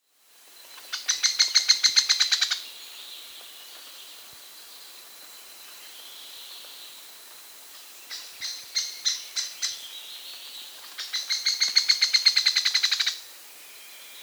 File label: Buff-Browed Foliage-Gleaner; Syndact; S. America